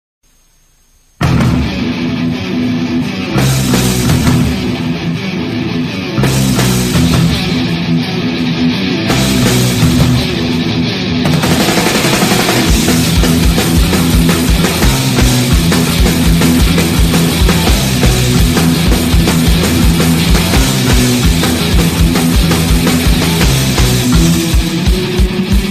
malay thrash rock ringtone

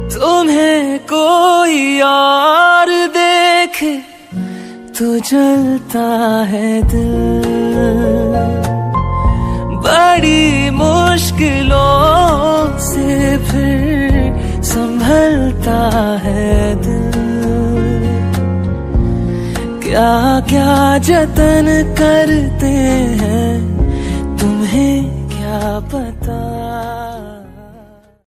romantic mobile ringtone

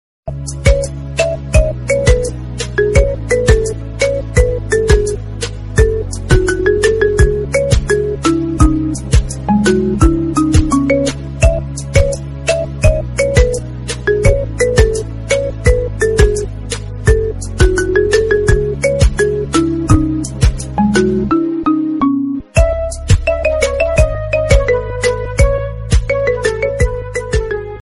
new classical call notification tone ringtone

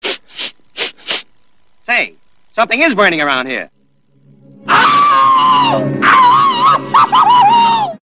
cat funny ringtone; tom cat

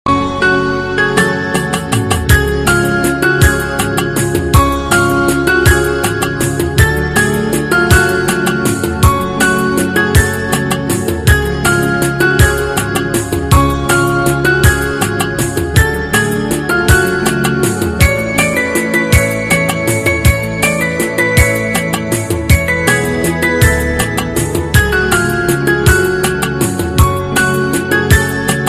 cute background music tone ringtone

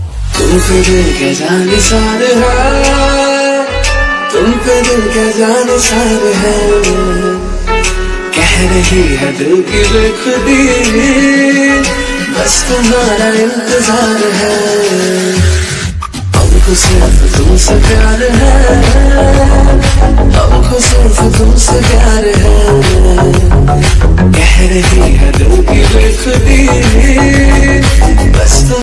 sweet mobile ringtone; bollywood ringtones

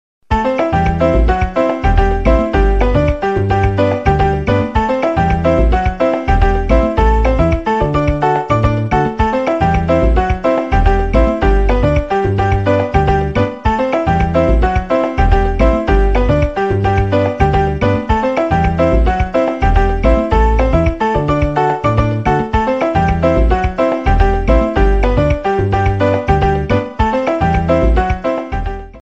cuban ringtone

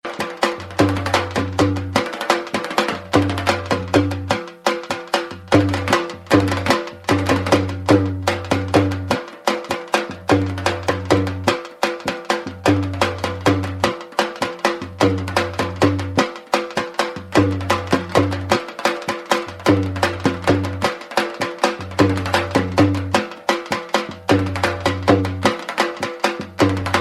dhol and dandiya ringtone

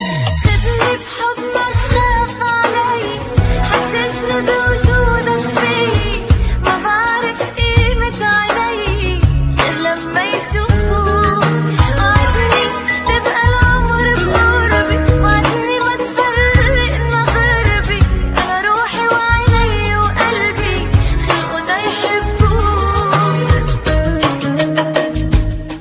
arabic ringtone

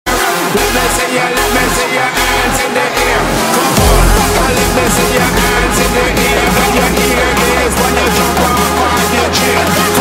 hardstyle edm ringtone

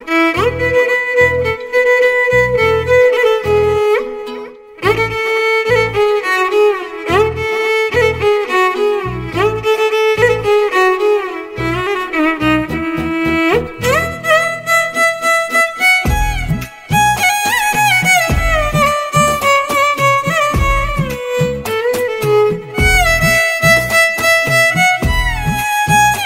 violin background music; iphone

